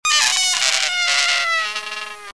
whine

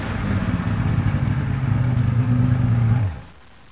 nautical

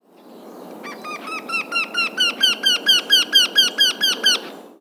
wendehal

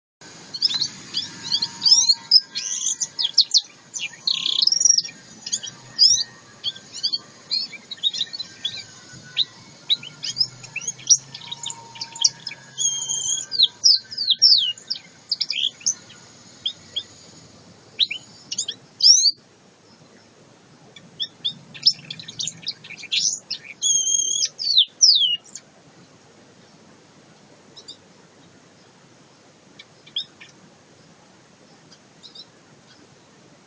black headed canary

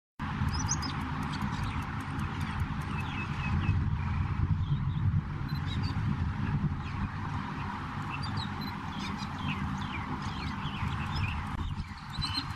superb starling

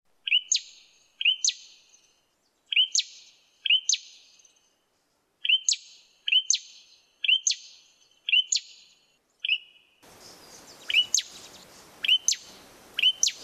singing cisticola

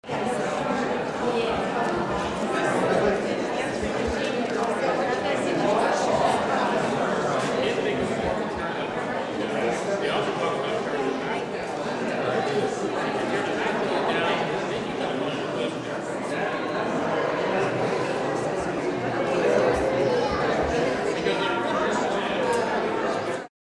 hallway crowd

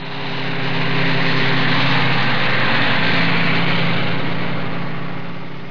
trucks

